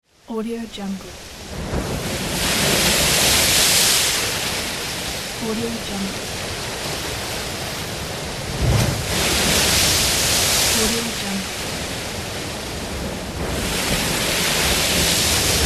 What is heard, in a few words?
ocean waves